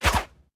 Item Flying; Ui Item Flying

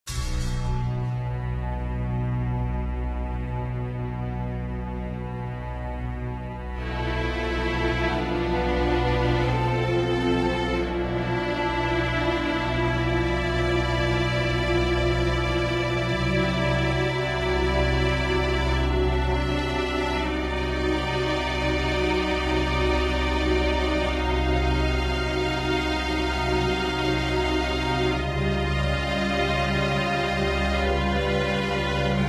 Dramatic; menherafflesia flowering abyss; android

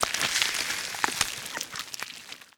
Revive; Legend of Keepers (gamerip) (2019); Skell Revive

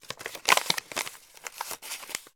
Paper

